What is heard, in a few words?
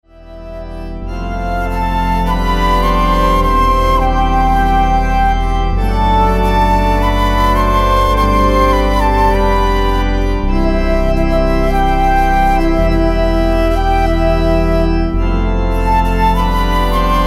The Pentecost Sequence Holy Spirit Lord Of Light instrumental